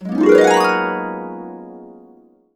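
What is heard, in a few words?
GB major, Harps; USB Soundscan vol.62; AKAI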